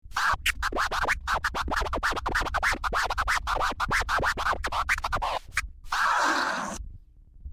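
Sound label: twiddle, scratch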